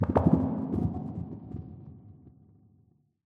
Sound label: amb abstract bubble impact, ambient